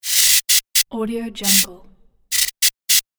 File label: neon sign short out flickering; floraphonic